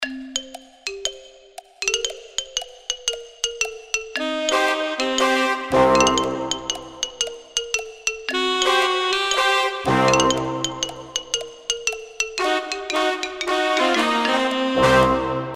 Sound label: Jazzy, ringtones